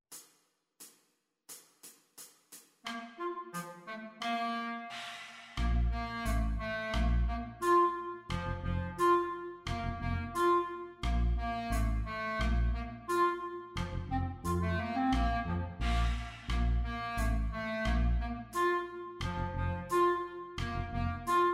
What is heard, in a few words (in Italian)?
duetti progressivi; aleo emanuele